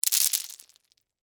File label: Debris Lima Beans; Texture; Materials